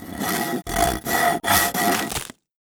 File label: saw working